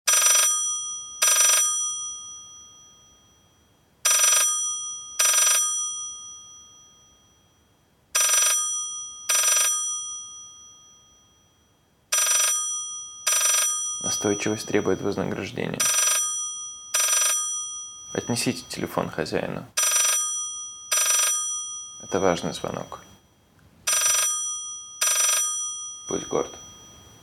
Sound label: ringtone